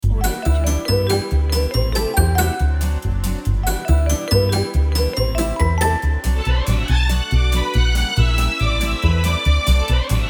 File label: 1950s tv time logo